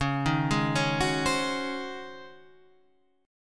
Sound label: C sharp Major 7th Suspended 2nd Suspended 4th C sharp